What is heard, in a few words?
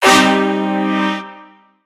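scatter 3 notes, slots; pork knox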